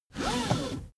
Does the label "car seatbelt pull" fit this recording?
Yes